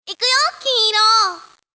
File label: boot; mushitama